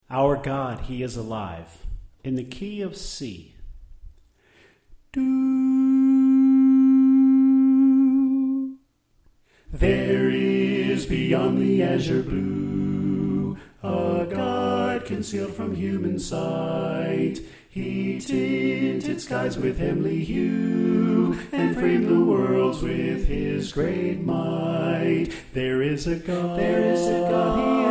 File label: Tenor Left